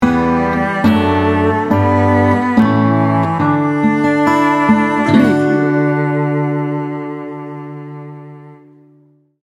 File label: tender heart sting bumper